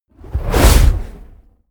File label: whoosh fire noise blast medium